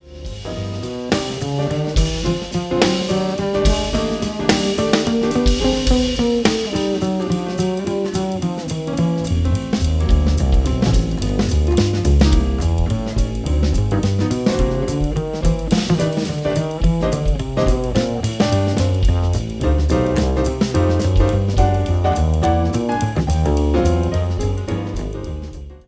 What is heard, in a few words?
c blues